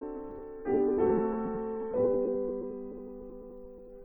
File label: piano test